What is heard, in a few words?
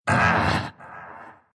pain, ru